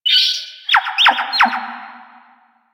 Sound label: Cave Creature; Ambience; One Offs; Creatures; Warframe; Quests; The New War; Drifter Camp